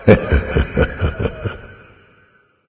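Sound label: "heh heh", nonverbal; smite; voicepack; khepri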